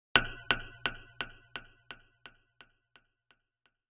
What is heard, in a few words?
drip delay